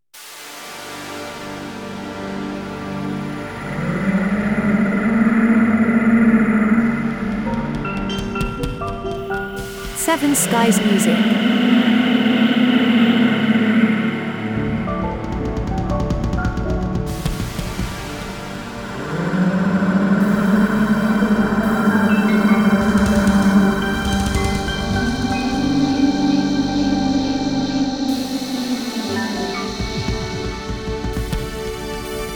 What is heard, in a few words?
Growling Creatures